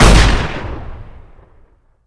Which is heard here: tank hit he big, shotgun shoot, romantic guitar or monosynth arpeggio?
shotgun shoot